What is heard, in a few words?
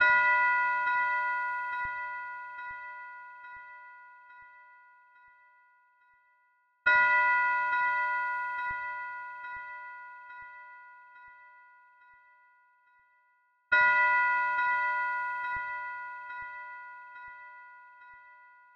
140 BPM E Min Bell, Drill; Cymatics; 2022 Melody Collection; Loaded